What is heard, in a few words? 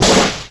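kraken attack; ill karken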